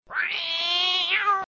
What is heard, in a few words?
msn cat